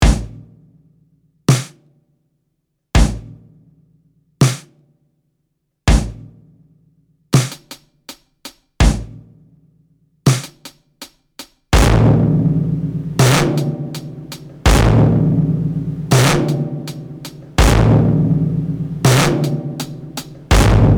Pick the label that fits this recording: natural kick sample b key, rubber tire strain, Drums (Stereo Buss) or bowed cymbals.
Drums (Stereo Buss)